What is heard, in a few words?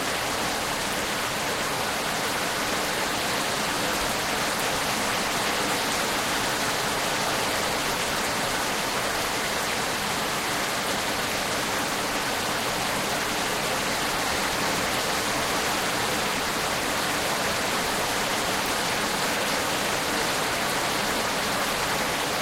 SFX rain heavy